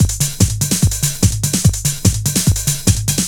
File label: LOOP, Eurotech; Sounds Good